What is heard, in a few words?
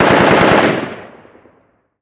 gun ak47 med; modern warfare 2; amb elements